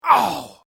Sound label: Male Grunt Human Injury Pain Vocal Ugh Ouch; Grunt Sound Effects